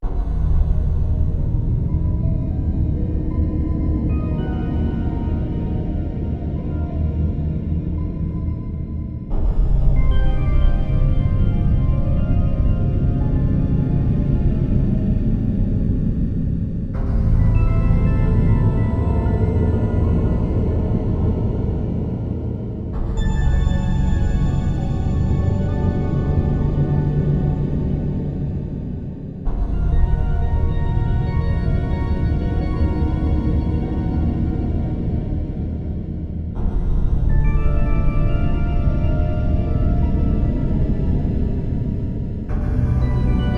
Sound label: Atmosphere II; Advanced Dungeons & Dragons Slayer; 3DO